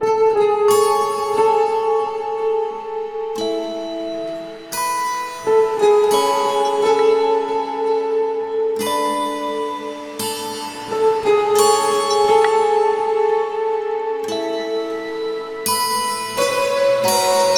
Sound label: Late-night Ambience ReMix; Animal Crossing New Leaf; OC ReMix